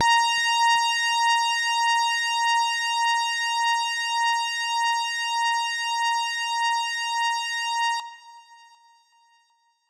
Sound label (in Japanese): 音程 女性 A4